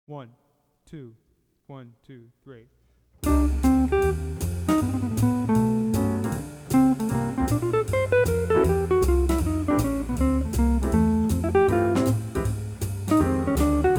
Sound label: lunar guitar